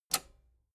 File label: metal button robust spring push disable